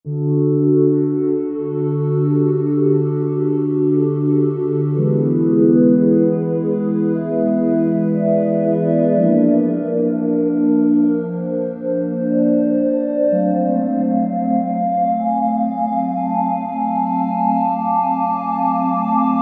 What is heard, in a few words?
Glass Organ, Roland V-Synth; Factory Patches